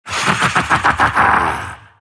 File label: silt laugh; dota; siltbreaker